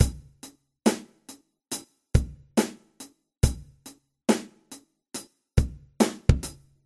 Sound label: drum parts